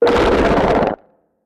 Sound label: HAMMERHEAD idle; Hammerhead gold